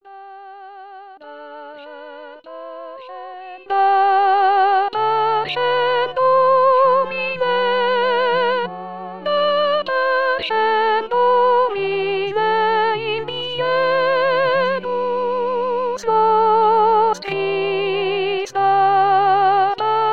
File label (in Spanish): T voz; dapacem